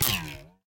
line damaged; Ultimate Fishing Simulator 3 Demo

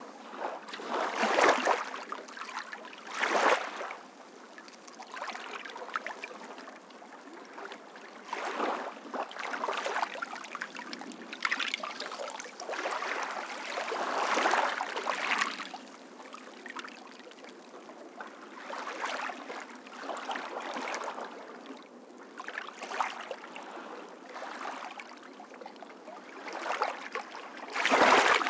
Atmospheric Sounds Sea Close; UltimateFishing

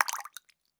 Worm Aquarium; UltimateFishing2020

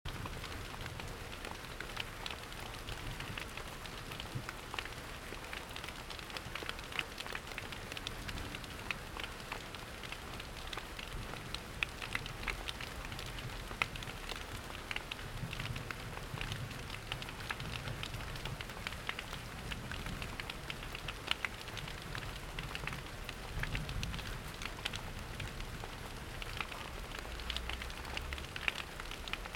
Light Rain Loop; UltimateFishing2020

